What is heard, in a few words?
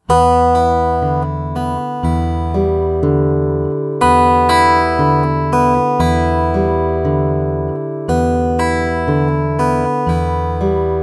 Fingerstyle, acoustic guitar; alternating bass fingerstyle pattern; Nigel Wheeler